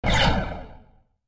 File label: ship Fire, enemy Weapons; gamegape